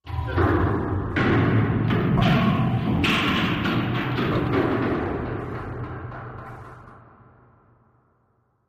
Silo Cleaner Machine H, metallic

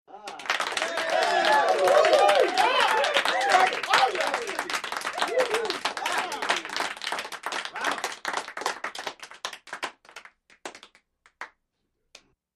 Game Show Sml Appreci

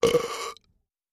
Nasty Small Belch; belching

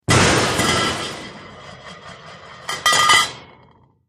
Hvy Metal Tank Smash

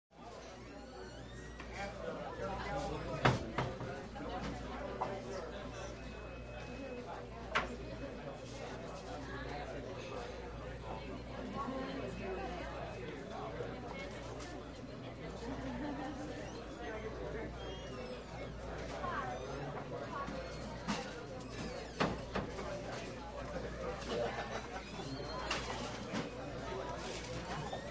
American Cafe Chatte, Restaurant Walla

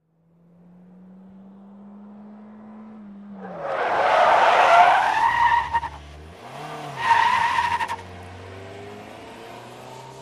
Sm Car 180 Skid, compact

